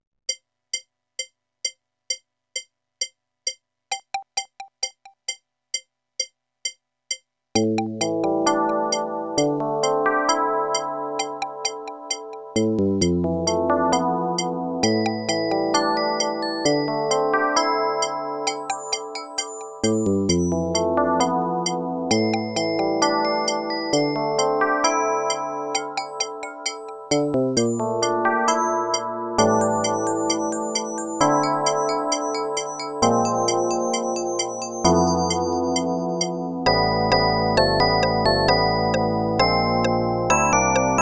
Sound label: ADLIB OPL2